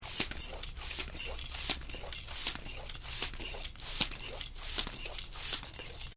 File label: Bicycle Hand Pump, Inflating Tire; Bicycle Hand Pump, Inflating Tyre; Vehicles; Bicycle Sound Effects; Bicycles (Sound Effects:Vehicles); Stuart Duffield